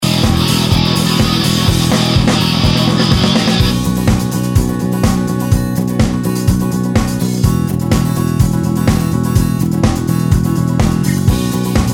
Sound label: clean guitar sample